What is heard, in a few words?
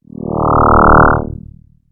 Portal